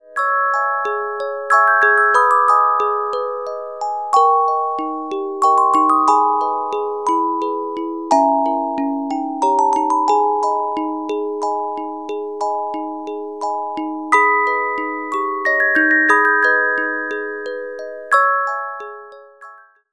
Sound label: Carillon Music Box Melodies